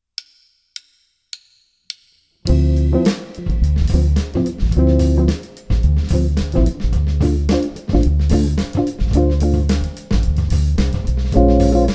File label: Vamp